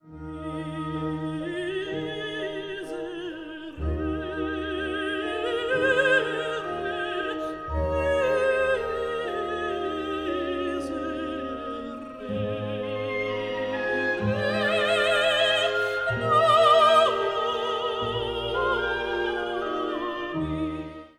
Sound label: Agnus Dei Laughing